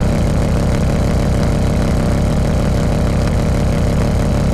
diff MOTO mid, vehicles; aronwk collection; ndaudio

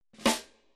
snare

